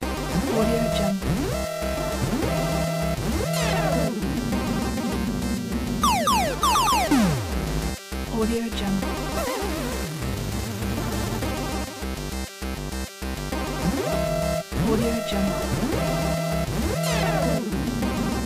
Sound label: 8-bit game music